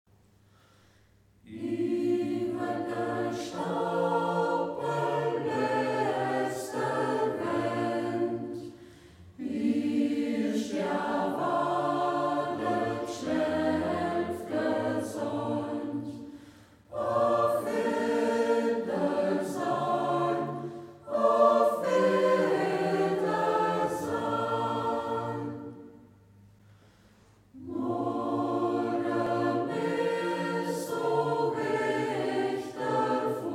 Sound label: chor, Zied; Ortsmundart: Zied; Grete Lienert-Zultner (Text), Grete Lienert-Zultner (Melodie); Iwwer de Ståpple (Uëwschied); Zieder Chor; Hegt wird gesangen